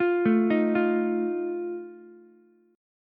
Eb Suspended 2nd Flat 5th Ukulele, Ebsus2b5 chord; Ebsus2b5 arpeggiated; JGuitar

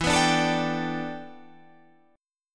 G Major Add 9th chord; Gadd9/E strummed; JGuitar

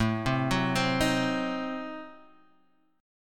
A Diminished 7th A, chord; 5,3,4,5,4,x; arpeggiated; JGuitar

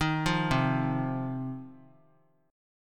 C Minor Double Flat 5th C down 3C 3F 3A 3D; Cmbb5 arpeggiated; JGuitar

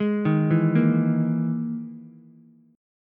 E Major 7th Flat 5th, Ukulele; EM7b5 arpeggiated; JGuitar

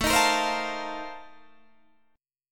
F 11th A, chord

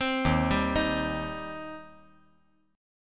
Eb 6th Eb down

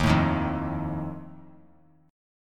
D sharp Suspended 2nd Flat 5th, chord; strummed; JGuitar

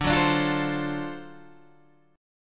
D 7th Sharp 9th, Guitar Open G

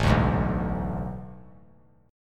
A Major 7th Suspended 2nd Suspended 4th; AM7sus2sus4 strummed; 9,9,11,9; JGuitar

